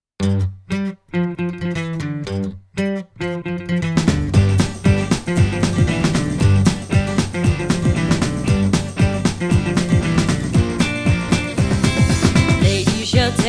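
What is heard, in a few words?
Female Vocal Harmony Trio; Girl Project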